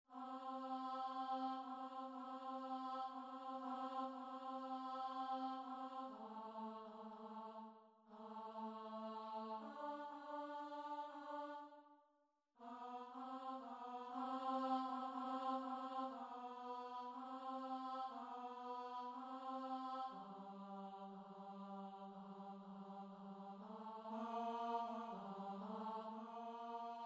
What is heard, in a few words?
ALTO; Locus Iste (Bruckner)